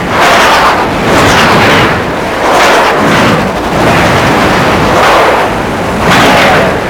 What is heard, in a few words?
Air Rush Loop, Surface Air Rush; Warframe; Archwing